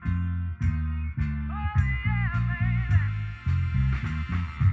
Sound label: Loop Bass Enhance